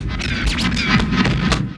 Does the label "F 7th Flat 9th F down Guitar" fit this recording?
No